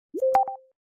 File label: instagram notification